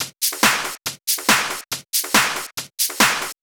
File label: Loop 140 BPM; Vengeance - Essential Clubsounds Vol.3